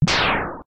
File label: shot